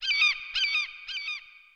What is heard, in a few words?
hawk